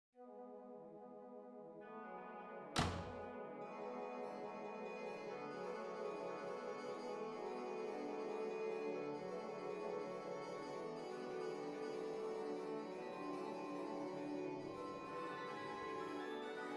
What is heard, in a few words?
ensemble